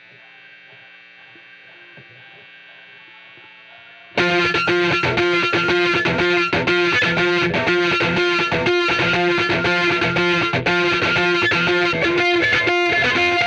Gtr

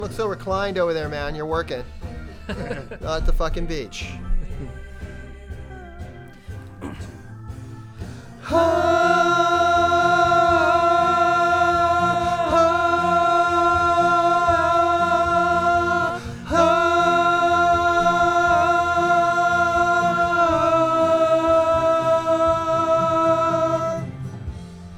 VOX Back

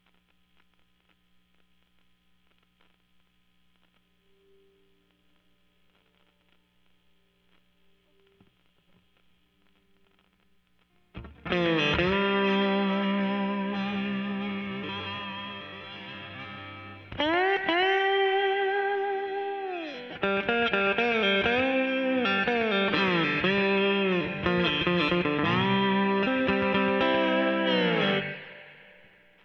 Slide Guitar

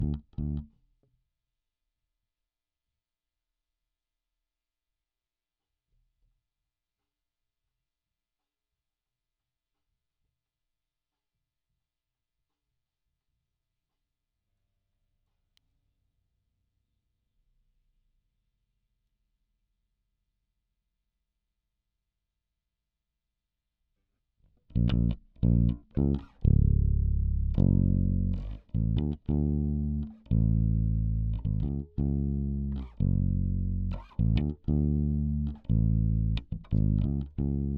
Bass DI

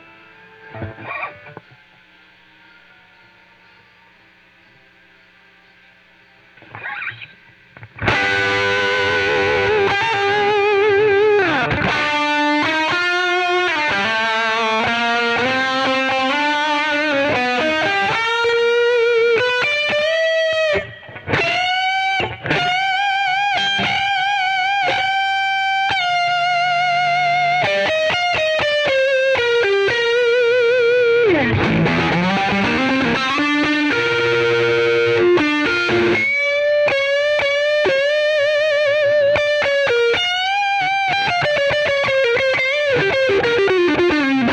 Solo Gtr

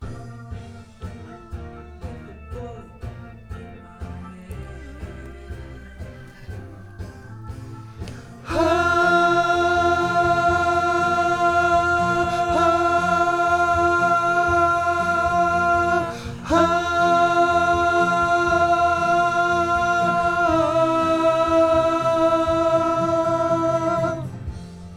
VOX Back

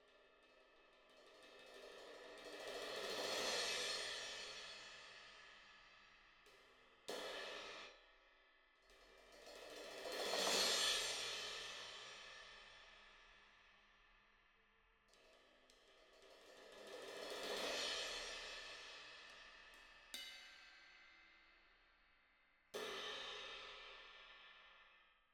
Cymbal

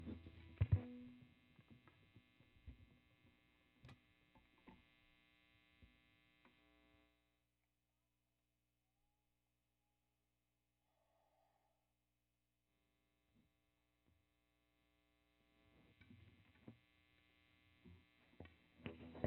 Gtr Solo

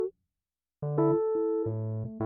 Keyz R

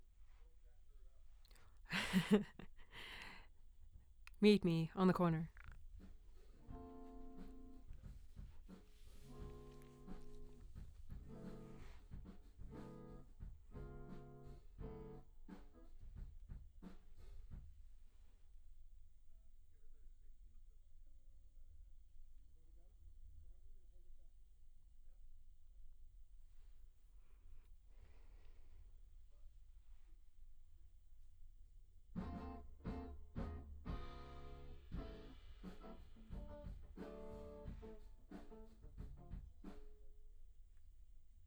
Ld VOX SM7

